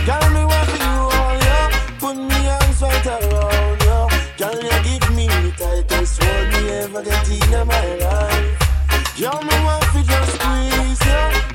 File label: Remix